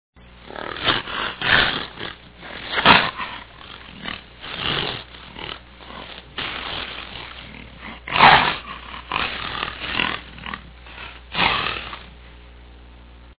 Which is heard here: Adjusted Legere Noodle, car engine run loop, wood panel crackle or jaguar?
jaguar